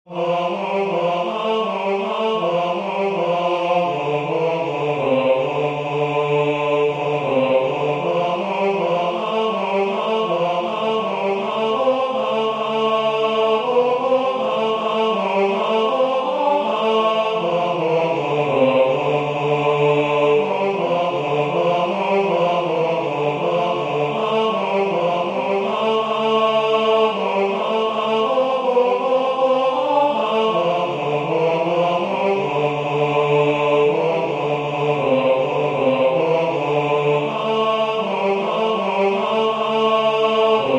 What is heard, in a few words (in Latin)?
O Virgo splendens hommes; Livre Vermeil